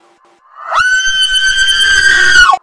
scream

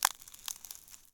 Ice Fields, ice; ice age scrats nutty adventure

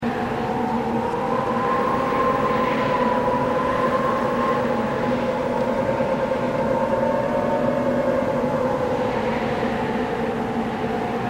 Mountain Window Wind Tunnel Loop; Journey

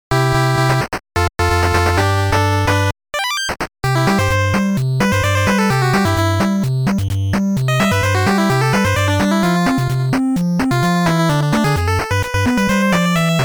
Stage Music; Armadillo; NES

